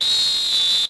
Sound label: whistle; Colin McRae Rally 3; XBOX